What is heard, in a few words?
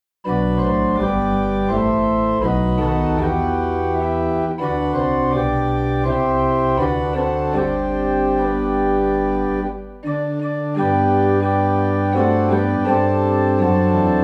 Organ in G; Infant Holy Infant Lowly; christmas